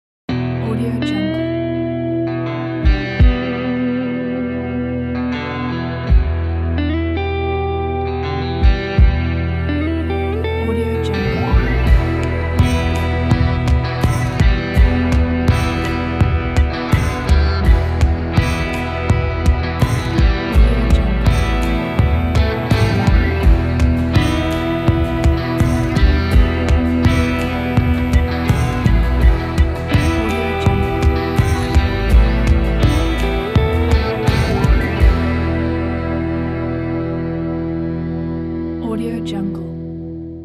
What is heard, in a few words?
western tv show main titles; musicdog